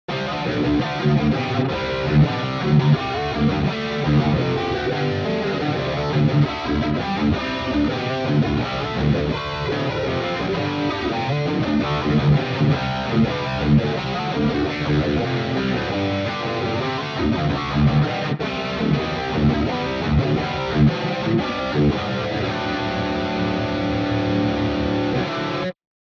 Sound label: Heavy Riff; MGK Signature